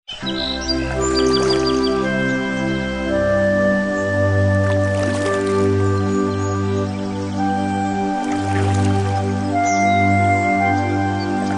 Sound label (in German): ambiente music